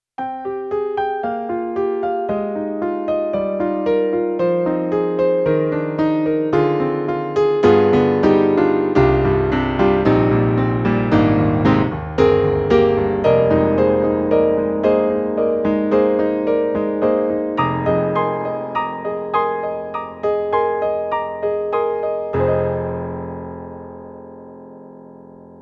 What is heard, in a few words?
Roland SC-88ST Pro Piano 2